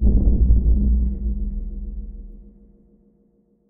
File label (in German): auswahl, menu